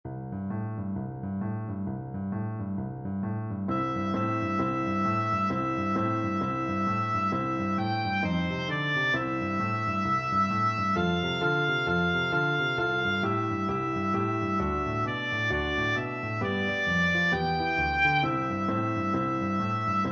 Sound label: jingle bells boogie, oboe